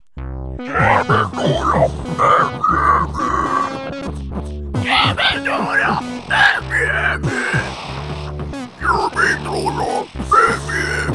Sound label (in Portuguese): vinheta